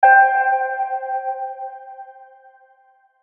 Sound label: Hit Piano Filter; Sound Design; Blastwave